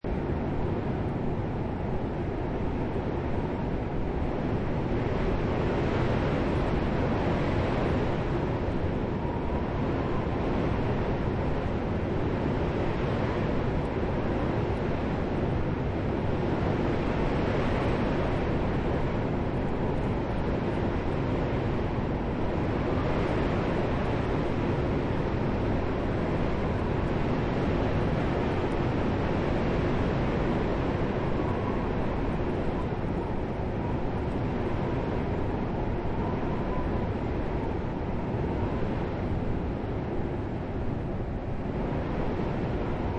Strong Constant Wind, An even gale wind blows over barren ground; snow or ice; A very slight howl comes and goes; Lynne Music